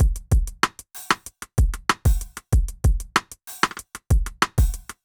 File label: kit; Sound Effect Library